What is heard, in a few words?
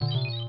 Kart Lose Piece; pac man kart rally java